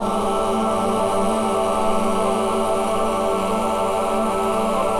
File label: Fake loop 95BPM; radiosonics